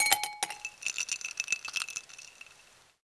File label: clink